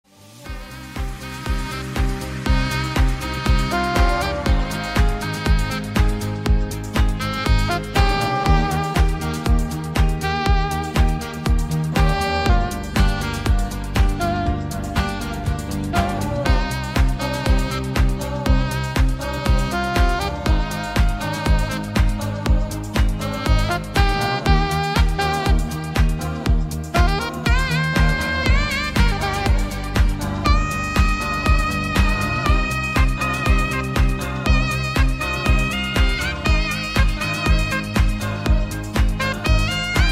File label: Sax